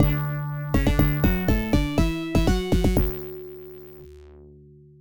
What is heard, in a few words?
Battle Win; Dyna Blaster; ibm pc at